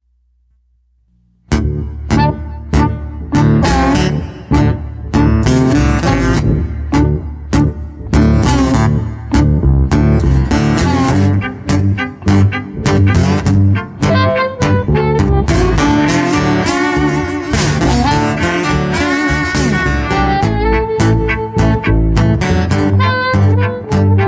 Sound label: walking blues